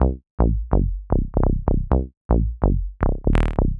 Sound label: 126 C BASS, Funky; Loopmasters; Funky Underground Vol 2; BASS LOOPS